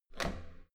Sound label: metal door heavy handle up